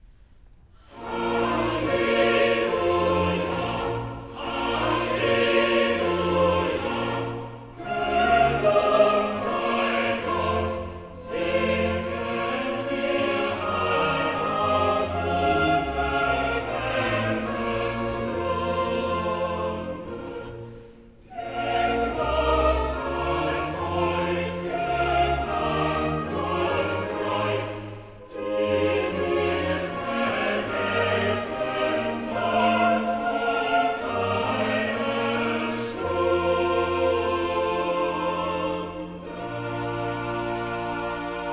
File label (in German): Choral; Cantata, BWV 110 'Unser Mund sei voll Lachens'; VII. Choral 'Alleluja! Gelobt sei Gott'; Bach; New York; Xmas Day + 2nd Day of Xmas